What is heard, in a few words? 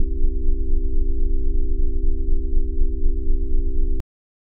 c major chord bass click, hammond